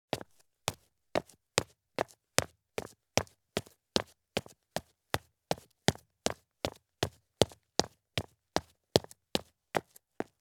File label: Men's Heavy Business Shoes Take Fast Steps on Concrete Stairs; Sound Ideas; Footstep Sound Effects